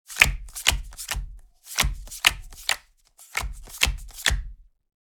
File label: Board game dealing cards; leisure